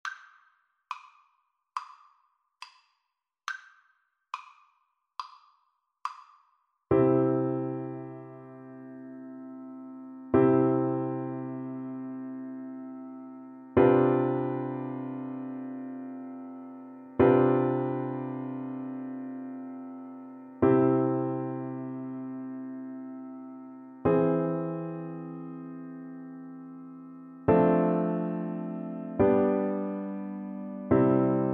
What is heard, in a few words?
piano duet